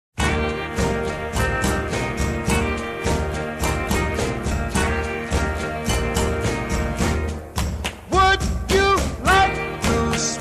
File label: swinging